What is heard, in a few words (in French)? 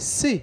reponse; Photo; JEU